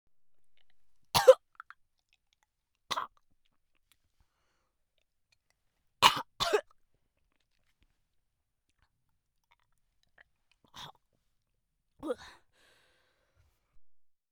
女人呕吐; renlei

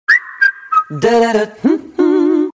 轻松愉快的口哨语言铃声

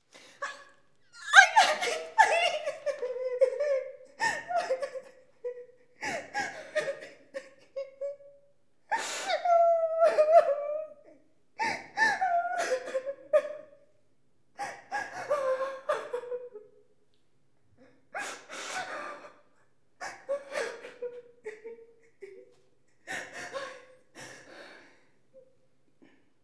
女人哭喊; renlei

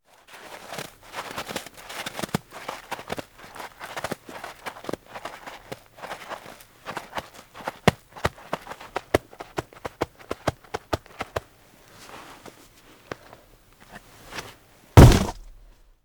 团雪球、打雪仗、投掷雪球

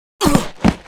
女死亡、倒地

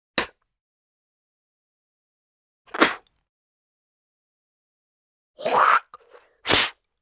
吐出

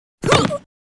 女死亡、倒地

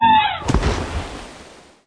女 死亡 倒地

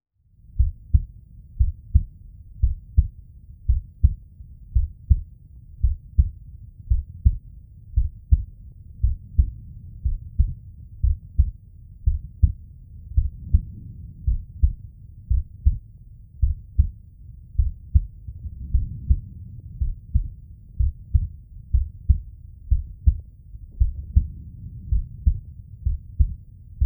心跳脉搏、次

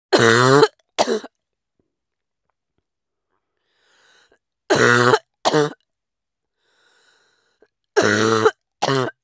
女人咳嗽; renlei

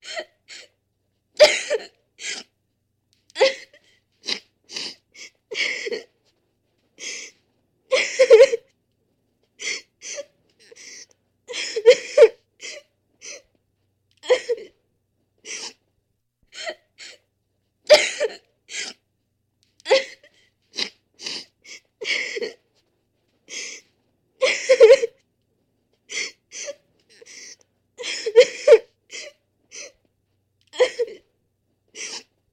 人女孩哭和鼻塞; People Girl crying and

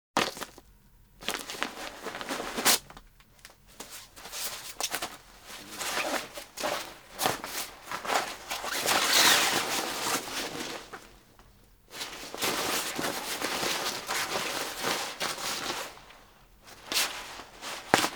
衣物(雨衣)

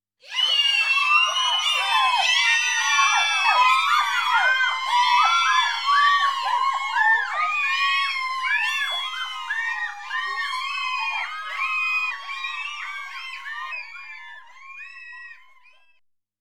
众人、女成年人尖叫

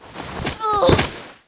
女死亡倒地

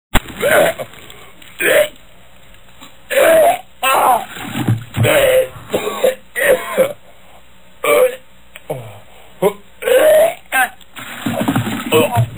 呕吐

